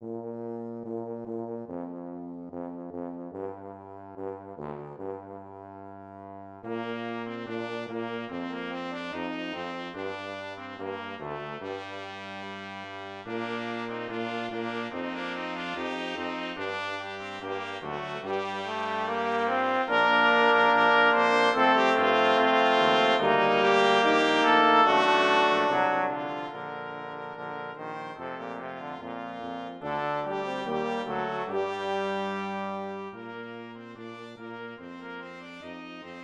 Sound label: midi